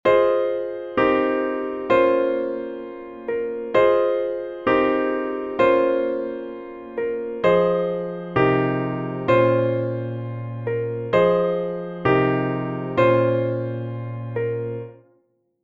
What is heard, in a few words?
chord voicing